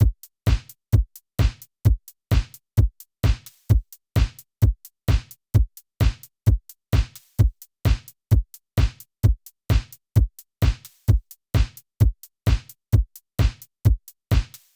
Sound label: Korg loop 130; Electro; Micro korg